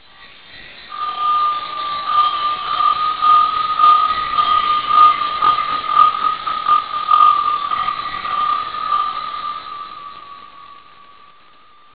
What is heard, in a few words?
drone; halogen tent